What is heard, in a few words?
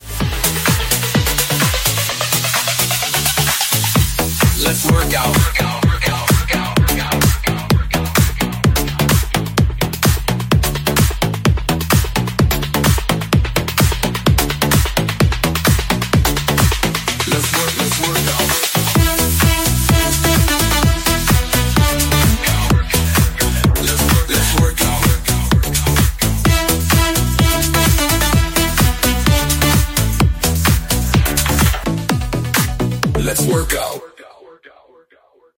Audition Music; Spring Break 2026; springbreakperformance